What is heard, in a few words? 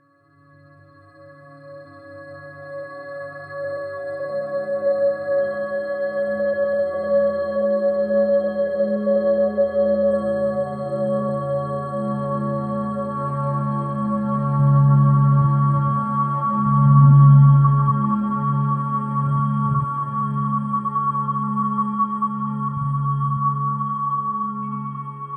Deep Glass Bells (with Harmonic C